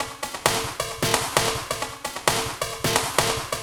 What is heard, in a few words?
Techno Snare; Dance DJ; Drums; Pro Tools Essential Loops; Digidesign